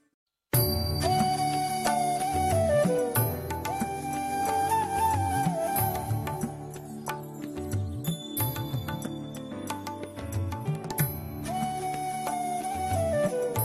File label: beautiful flute; minnale